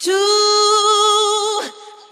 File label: "two" SUNG